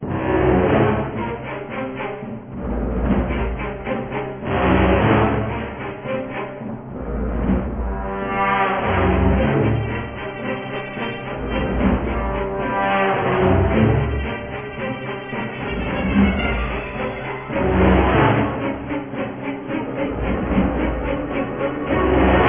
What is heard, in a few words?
Tankdrive Music